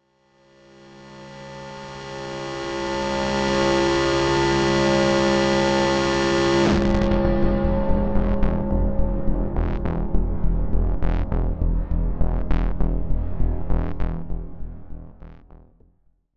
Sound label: melting siren pitched